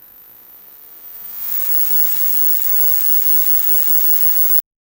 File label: light buzzer for gameshow